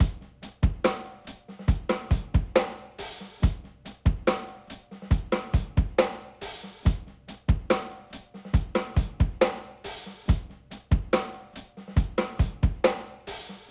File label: rock